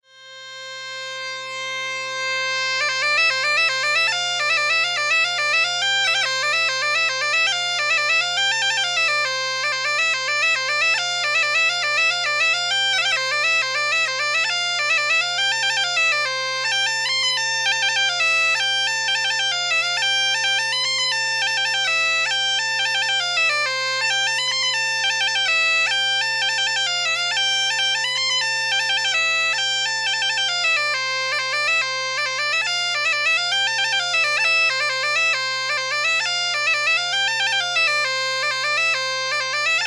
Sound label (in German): gaita melodie